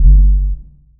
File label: sub hit